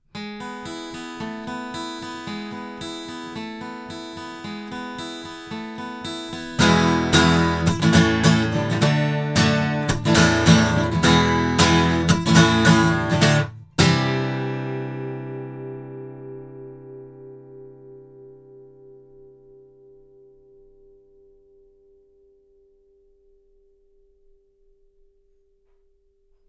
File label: Avant CK-7 omni guitar